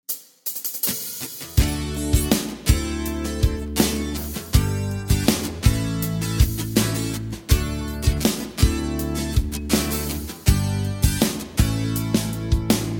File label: (D) No Backing Vocals